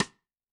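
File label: Snare Side Stick; Yamaha DTXpress-II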